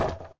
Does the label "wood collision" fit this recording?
Yes